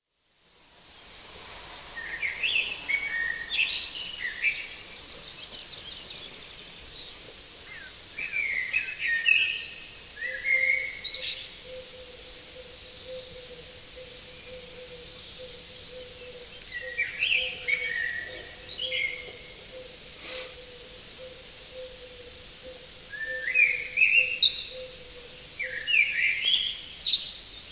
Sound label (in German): Gezwitscher